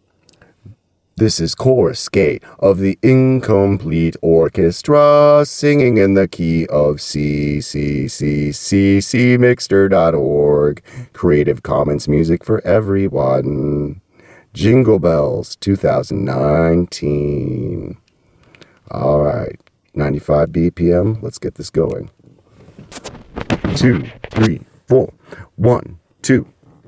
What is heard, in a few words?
Jingle Bells AutoTune